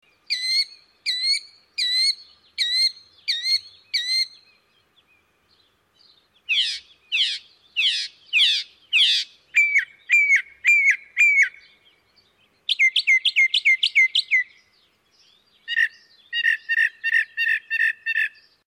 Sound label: Northern Mockingbird, Birds